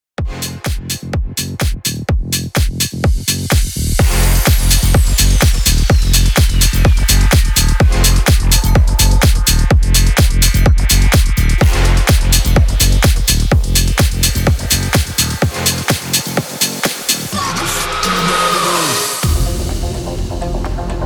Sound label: HOUSE MIX 126 BPM